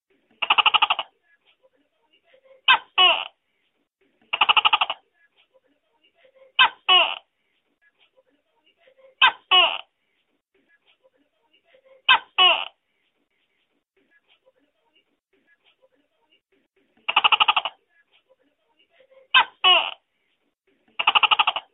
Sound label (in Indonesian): suara burung beo master tokek